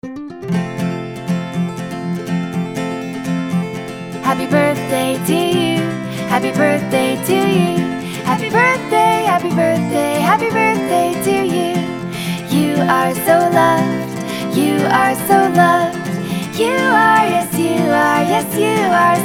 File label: Happy Birthday Song